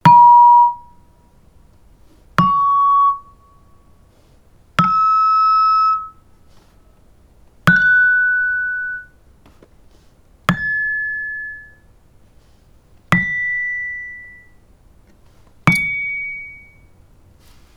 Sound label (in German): Saron klein abgedämpft; Indonesien; HB-Samples